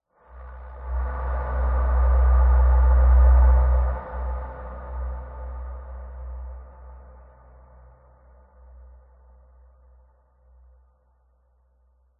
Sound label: sound design airy blowing tone long reverb eerie desolate; zapsplat